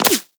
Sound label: Laser Impact Flesh; Warframe